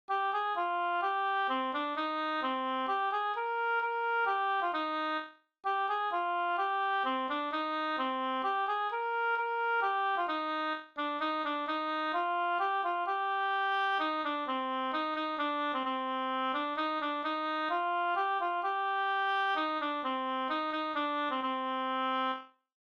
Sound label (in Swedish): langdans ringdans; fr Og Ahlstrom